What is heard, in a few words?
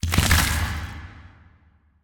Boss Presidente Human Death